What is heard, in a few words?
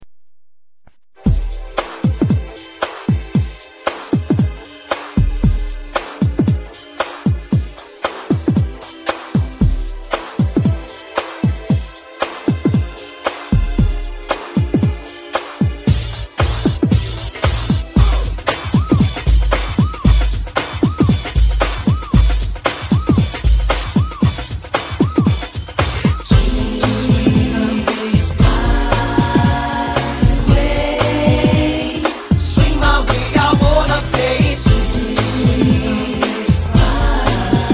swing